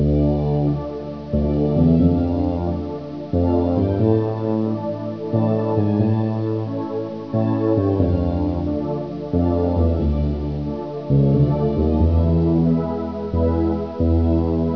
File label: fant walz; fantasy